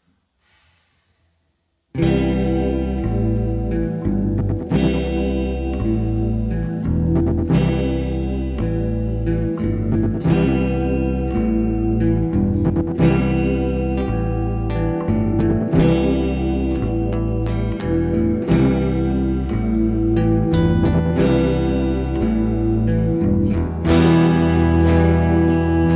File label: Middle March